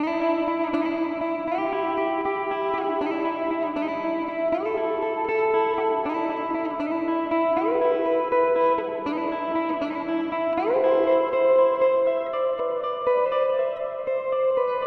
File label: Plate; Golden Reverberator